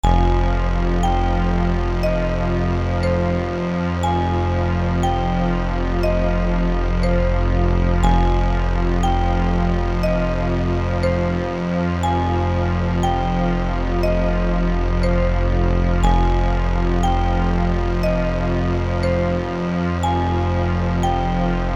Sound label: Game Over; Cross Arena